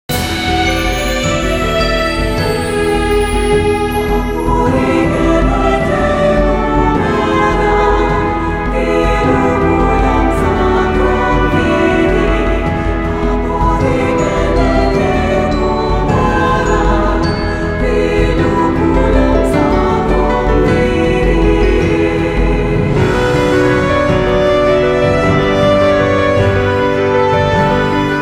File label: Fast Amb; music; The Da Vinci Code; XBOX